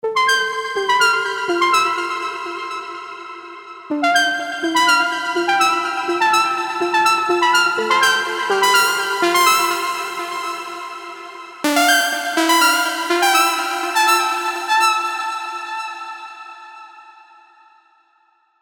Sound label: melodic leads; Synthetic Dawn; Revealed Serum 2 Melodic Leads Vol. 1